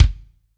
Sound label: AM KICK, AMBIENCE KICK